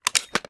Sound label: reload empty fast magout; reloads; gmod; iw8